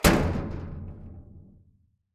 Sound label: Dumpster Hit